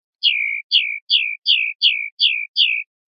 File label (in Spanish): golpes; non non; pardillo